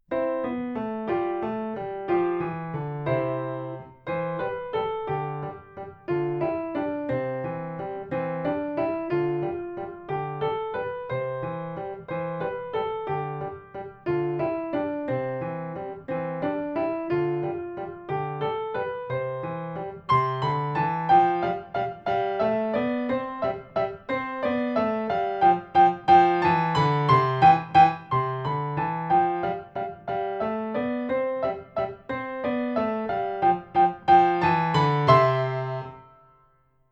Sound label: Scale Waltz